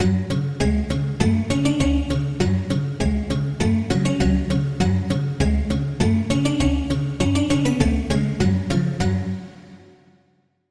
Key

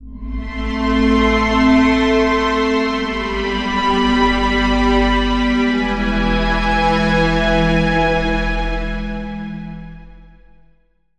Pad, Serum 2; Pad - Parallel Universe; Routed Sound; Serum 2 Expansion - Quantum Enforcer Vol.1

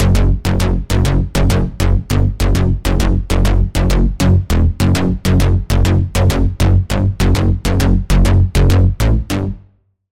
Bass, Sylenth1

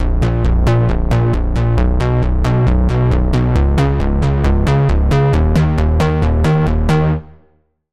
Bass; Routed Sound; Sylenth1 Expansion - Reality Maker Vol.1

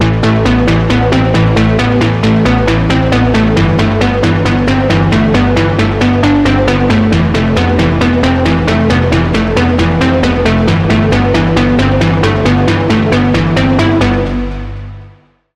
Key; New Color; Routed Sound; Vital Expansion - Reality Maker Vol.1

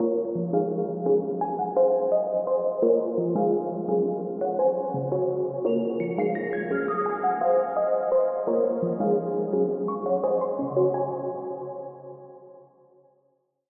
Pluck; Vital Expansion; Street Vanquisher Vol.1; Routed Sound

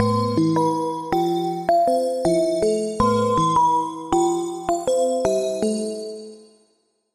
Key; Routed Sound; Serum 2 Expansion - Street Vanquisher Vol.1

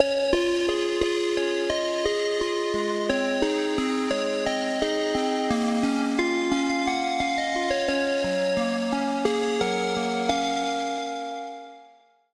Pluck; Routed Sound; Spire Expansion - Flame Summoner Vol.1

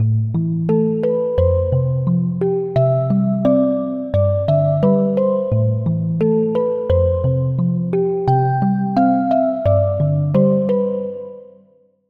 Bell

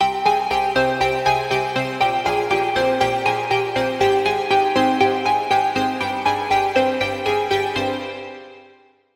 Pluck, Serum 2; Pluck - Artificial Twilight; Routed Sound; Serum 2 Expansion - Reality Maker Vol.1

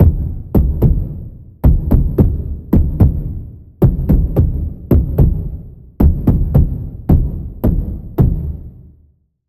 Impact, Sylenth1; Impact - Base Lab; Routed Sound; Sylenth1 Expansion - Quantum Enforcer Vol.1

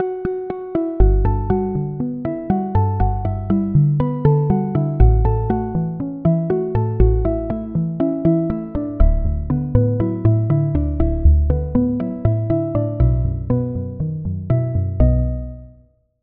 Key, Sylenth1

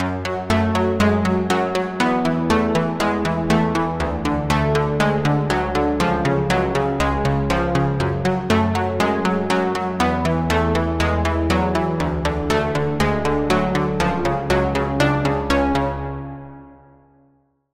Pluck; Under Craft; Routed Sound; Sylenth1 Expansion - Street Vanquisher Vol.1

